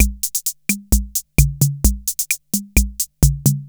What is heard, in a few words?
KR33 RUMBA, drum; Crypton - DrumBase5000